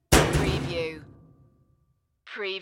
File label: Slam Washer Shut